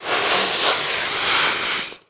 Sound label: sand shot; ambient savanna; World of Tanks Blitz